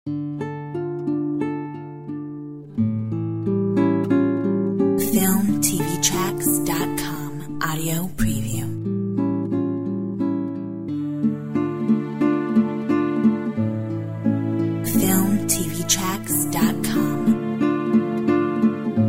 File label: Flamenco lament